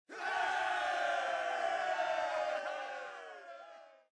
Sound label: Cheer; Age of Empires III; cinematics